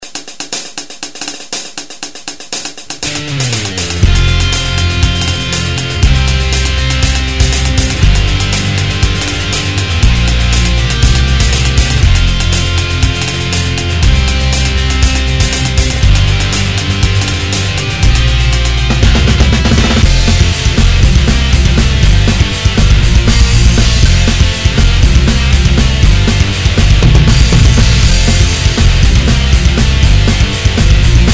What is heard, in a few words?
punk-rock